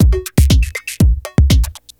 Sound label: Tech Beat 120, techno 120bpm; musicradar; Beats